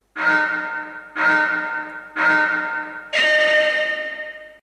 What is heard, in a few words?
countdown sound; gran turismo